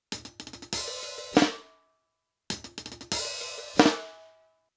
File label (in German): Mackie EM89D Abstand 20cm Snare HiHat Funkverbindung